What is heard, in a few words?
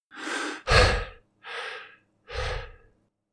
Male Breathing; Phasmophobia